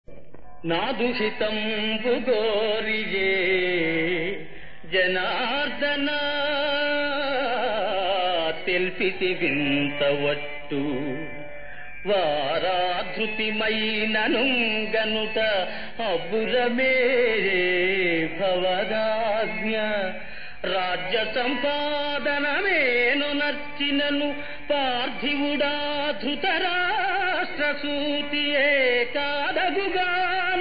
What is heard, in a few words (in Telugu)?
Padyam; SriKrishnaRayabaram